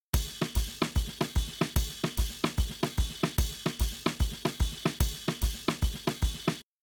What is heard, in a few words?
Drums Shuffle SN RC; WDR2